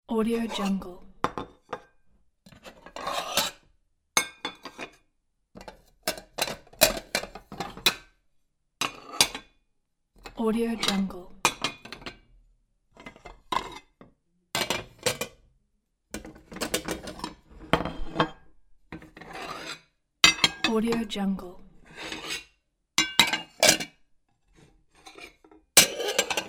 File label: stacking plates